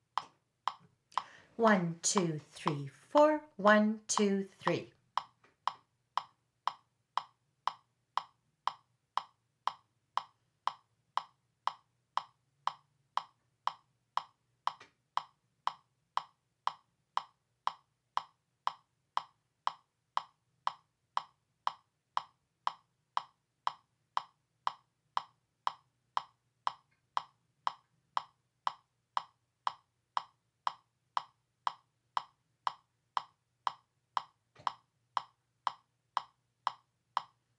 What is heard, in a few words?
Rondeau METRONOME